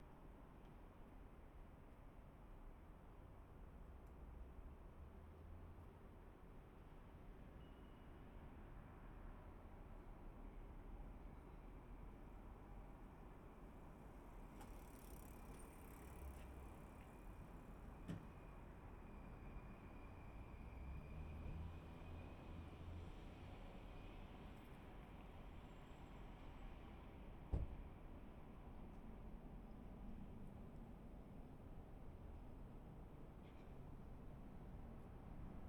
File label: Binaural